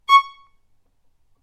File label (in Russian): zvuk noti do diez tretya oktava